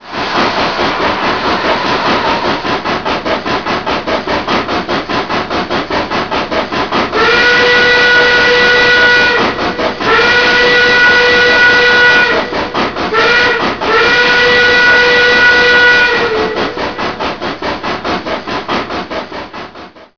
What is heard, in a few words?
steam